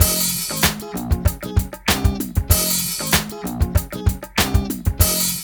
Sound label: MIX, Funky Ass Loops; East West